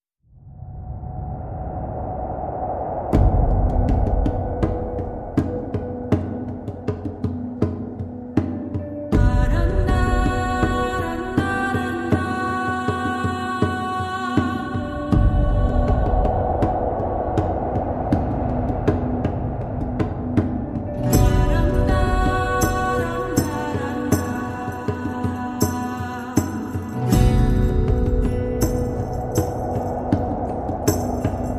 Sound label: Vocal